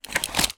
household medicine tablets pack foil blister pack remove from box; zapsplat